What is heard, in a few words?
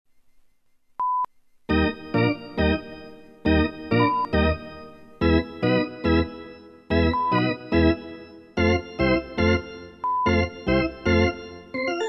baseball Music